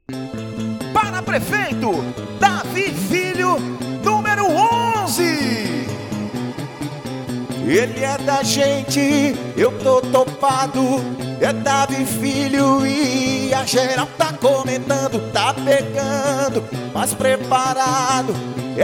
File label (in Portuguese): jingle